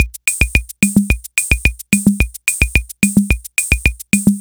B Cha Cha

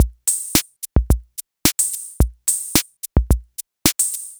Disco 1 A

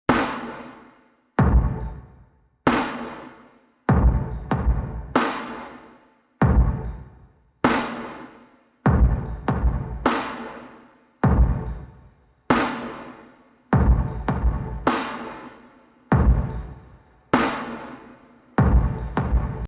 drums with expander

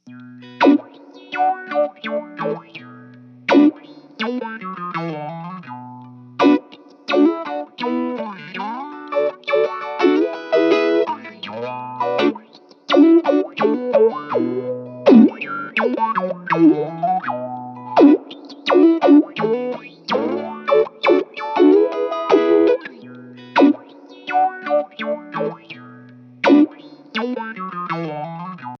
DOWN RESONANCE

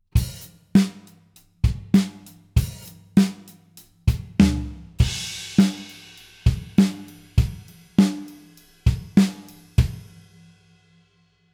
HP LP Snare Mic Setting FULL KIT

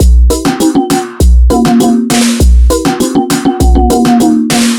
Reggaeton Beat

